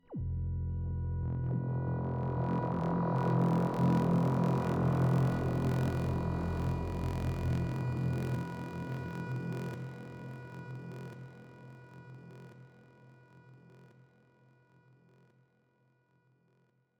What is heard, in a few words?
ASR10 Singing Syn